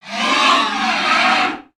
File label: Pets Triceratops Beg; creatures; Lego